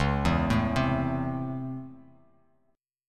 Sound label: C sharp Minor Major 7th Sharp 5th C sharp Bass Standard; C#mM7#5 arpeggiated; 9,7,7,5; JGuitar